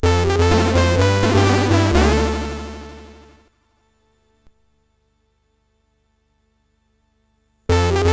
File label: sid, MOS6581R3